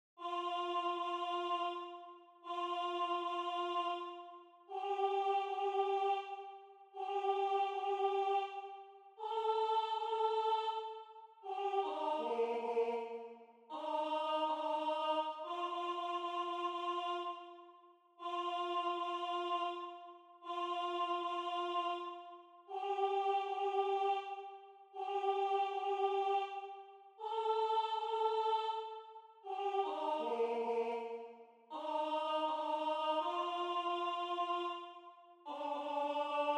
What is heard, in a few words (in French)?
alto